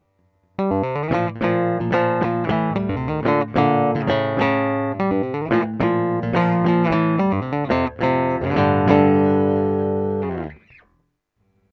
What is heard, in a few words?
Mix chords